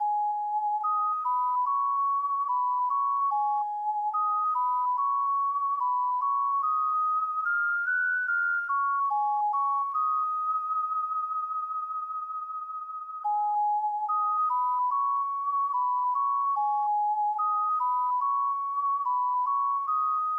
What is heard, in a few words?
WHISTLE; Chaos Seed; SNES